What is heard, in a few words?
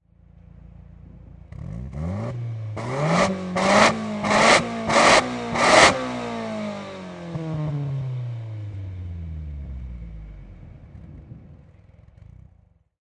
Nissan Silvia Exhaust Multiple Revs, Auto, Power Car, High Performance Car Sound; Exhaust perspective. Engine revving, multiple revs